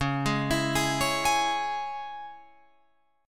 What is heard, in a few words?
C sharp Minor C sharp Guitar Open F, chord; arpeggiated; C#m; 13,15,16,15,16,15